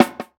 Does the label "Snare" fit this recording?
Yes